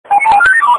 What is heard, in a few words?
italian police radio, ringtone